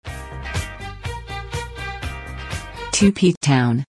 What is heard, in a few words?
jingle; tupe town